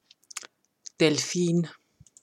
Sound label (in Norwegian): Delfin